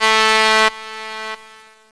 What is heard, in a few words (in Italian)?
nave, Veicoli; Effetti sonori